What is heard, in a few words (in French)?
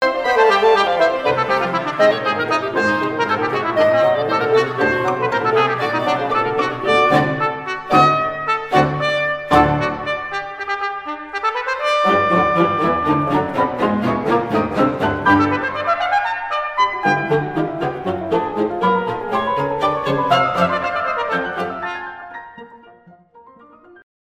Trompette; la revue de cuisine